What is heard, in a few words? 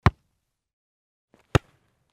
Football ball kick